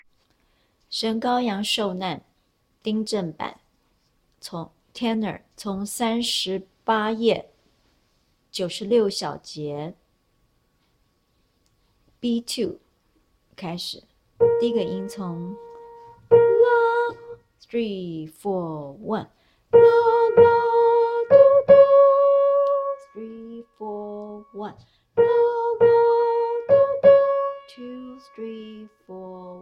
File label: Tenor